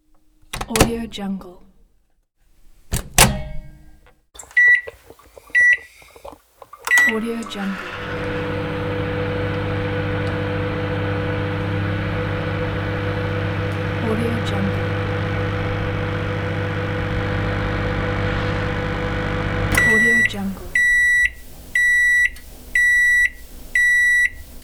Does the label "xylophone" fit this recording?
No